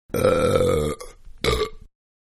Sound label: Burp Two Long Then Short; Burping; Human